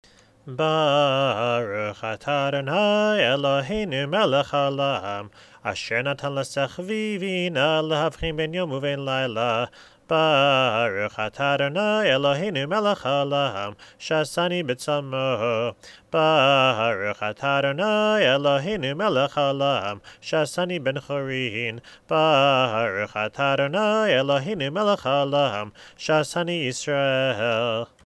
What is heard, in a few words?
Birchot Hashachar